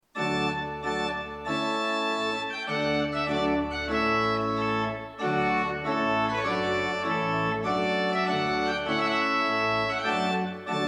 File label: trumpettune; gamle oppegård; vielse